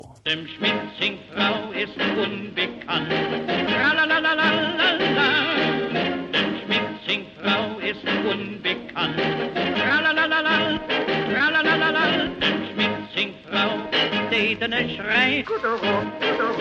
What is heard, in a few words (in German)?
sing Frau